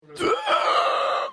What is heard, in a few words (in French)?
truie